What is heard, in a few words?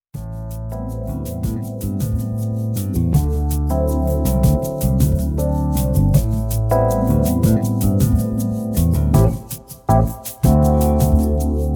retro chillout